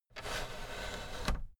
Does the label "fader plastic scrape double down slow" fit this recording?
Yes